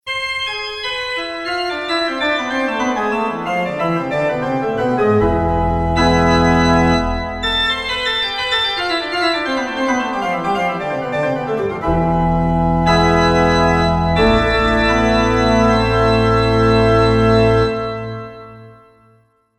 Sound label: Organ, pipe organ